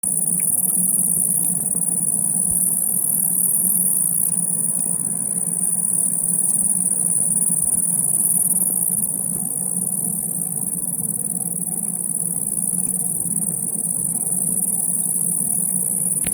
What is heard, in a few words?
fox